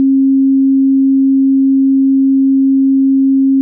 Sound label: bell sounds